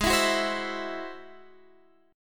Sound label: G sharp Augmented 7th G sharp, down, Guitar, Half Step Down